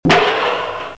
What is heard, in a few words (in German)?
Zusammenstosz